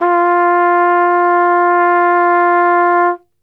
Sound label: F 2, Brass; Best Service; Brass Super Section